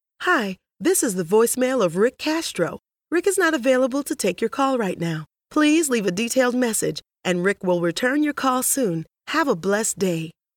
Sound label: uplifting